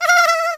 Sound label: laugh, Nagging Wife; speech